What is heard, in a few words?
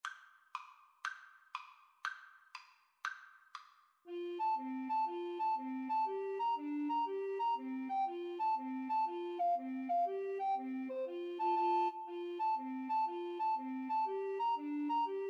irish jig medley, Recorder Trio